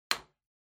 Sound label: Sellotape Put Down